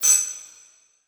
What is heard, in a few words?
Transition Hit Impact Ping